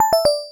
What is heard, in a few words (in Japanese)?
キャンセル; LcdDem SFX